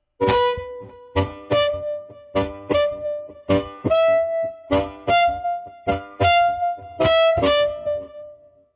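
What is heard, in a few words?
Pull Diatonic Slow, harmonica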